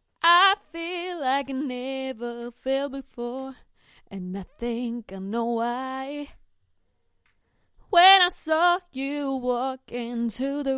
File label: pop rnb 124 Fm, Acapella; Female; Singing